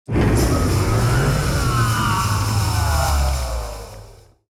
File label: transformation